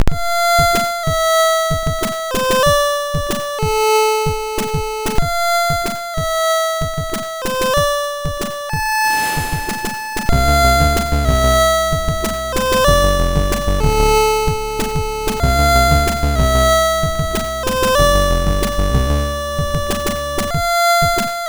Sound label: MOS6581R2 sid